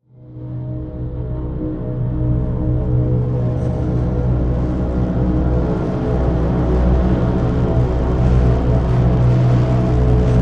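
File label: Drn B, drone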